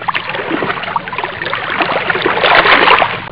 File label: water; Pirates; ST-XX Amiga Soundtracker Sample Packs